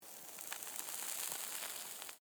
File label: Cigarette Inhale, Foley, Cigarette, Inhale, Sizzle; Smoking (Sound Effects:Human)